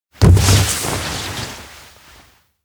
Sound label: hall belly slide; rikka; fumiya; anemoi